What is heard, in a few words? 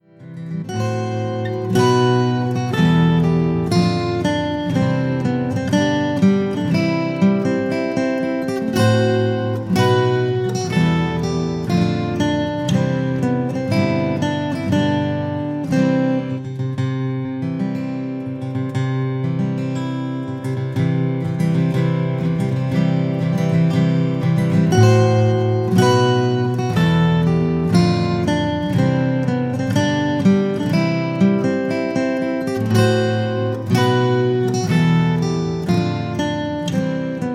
Wedding March Guitar